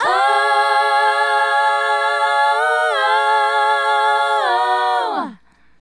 Female Group, Hip-Hop and RnB Vocals

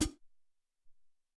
Foot hat, Alesis SR-18

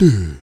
Voice Tom; Roland BK-5; Vocals

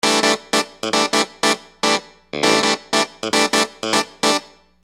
Phunky Clav; Construction Kit; HIP-HOP; Sonic Foundry (Sony Creative Software)

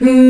VOX WHO C4, Scats Choir; 90s Sample CDs; Club-50 - Foundations Roland; Short Scats

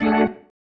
Stab One Shots, Organ; Sonic Foundry (Sony Creative Software); Joe Vitale Organ Donor

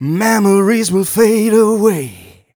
m 127 mel a, Vocals

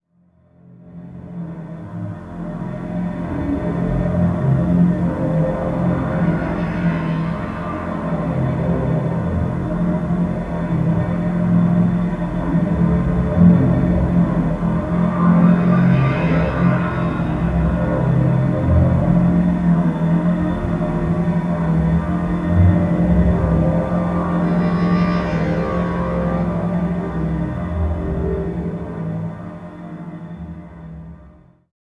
Humming Viola, pad; Sonic Foundry (Sony Creative Software); James Johnson Spektral Minimalism; Pad FX

